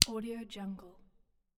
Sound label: drumsticks hit